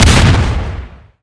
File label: hornetnest explode